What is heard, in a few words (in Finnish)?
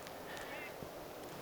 vesilinnun aani; olisiko jouhisorsa